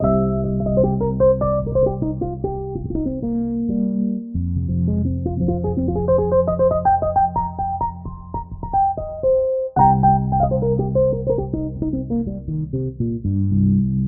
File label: Smooth E-Piano; KORG OASYS; oasys assault